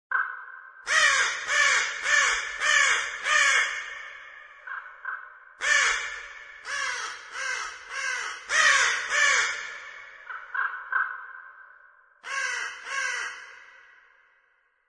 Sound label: ambient bird; game rip; mabinogi